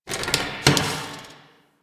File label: Derelict Metal Creak Small; Warframe; Orokin Derelict